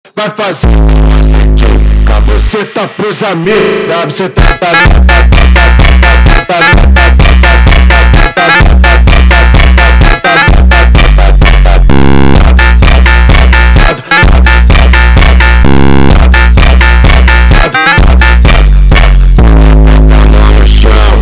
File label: Funk